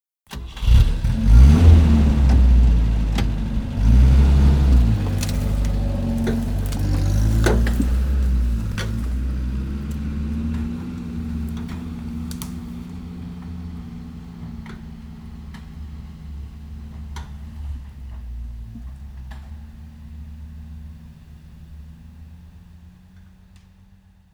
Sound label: Jeep, Volvo